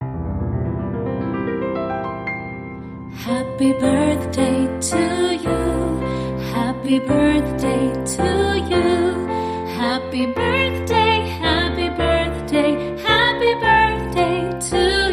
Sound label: Female Vocal with Piano Happy Birthday